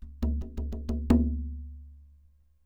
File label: DARABUKA; AKAI S6000 CD-ROM; WorldSounds; DAF+DARABUKA